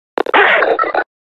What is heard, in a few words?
hit; combine